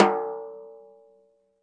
natural sd rim off, snare; single hits

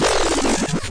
Electro FX; Ueb HE Electro FX

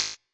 machine, Machine Electro Hits; Hip Hop; STYLE KITS (Hip Hop, Etc)

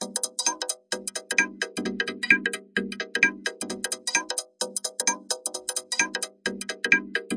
130 bpm vocode swing drums

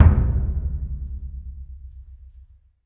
big burundi, African Burundi Drums

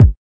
kick

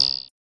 cyborg Insect; Cyborg Kit

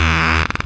buzzsaw bass synth

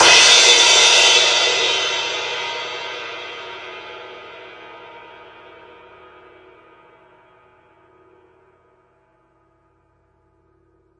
long real crash; Gongs and Super Crashes; single hits

